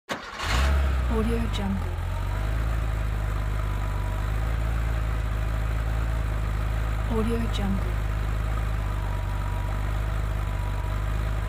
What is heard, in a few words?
car engine